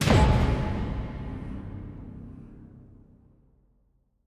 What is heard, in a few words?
Mus Multi Jingle; Chronicles of Riddick Assault on Dark Athena